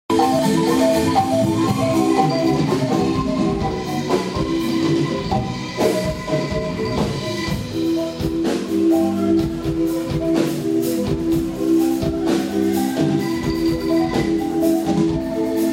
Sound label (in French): musique traditionnelle indonesienne; bandung